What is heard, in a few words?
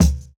Kick, jungle breaks drum sounds